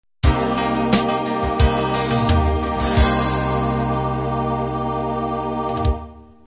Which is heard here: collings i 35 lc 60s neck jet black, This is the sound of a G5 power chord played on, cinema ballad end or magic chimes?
cinema ballad end